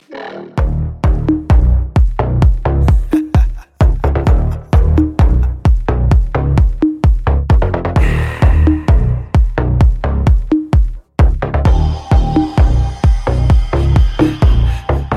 Drum And Bass

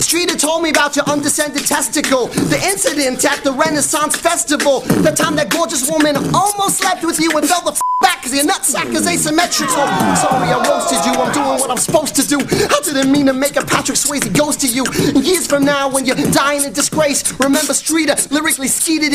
Rap Battle

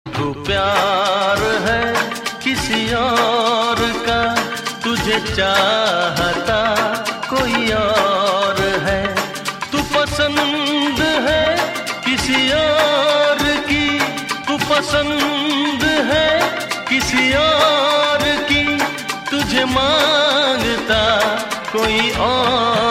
Sad Love Song

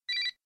text tone; Aoba; dmmd

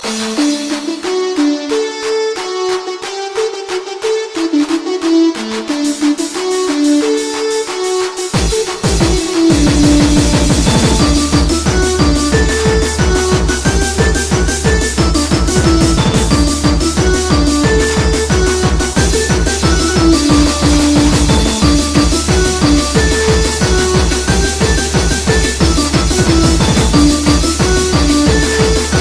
Gabber

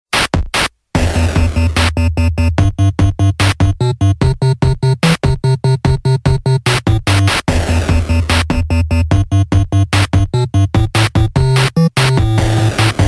8bit; DMMd Tears

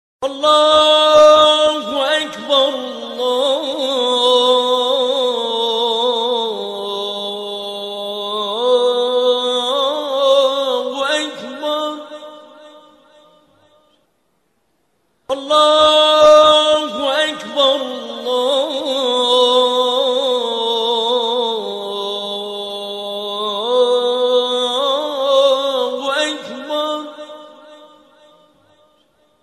Adhan

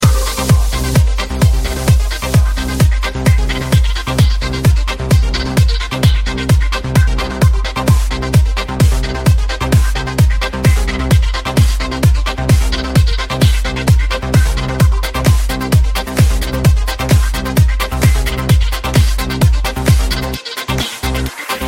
Bollywood Remix

